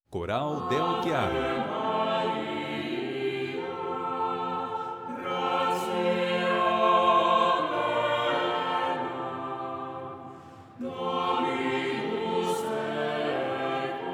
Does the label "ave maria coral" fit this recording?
Yes